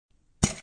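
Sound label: Mixer Lid Open, Popping open a metal ice shaker.; Drink Mixing; Bars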